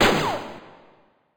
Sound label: shot; Monster girl quest Part1,2,3; Visual Novels